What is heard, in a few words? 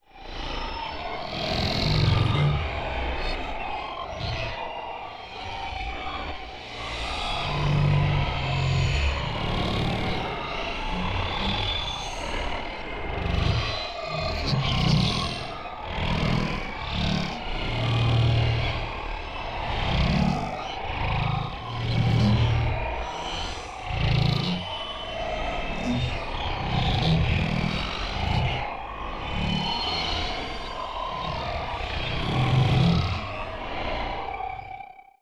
MOVEMENT Cybernetic Unrest; NEON; boom library